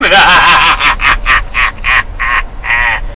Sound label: haha; svencoop; sandwich